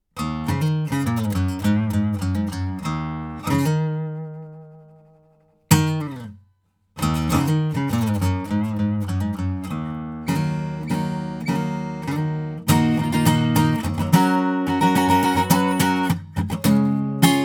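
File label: lct1040 acoustic guitar sound samples tube clear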